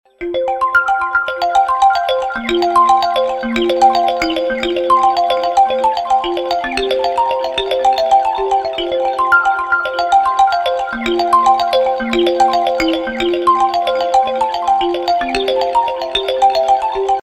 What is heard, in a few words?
Circulation of fluid, ringtones; ZTE Maven 2 Z831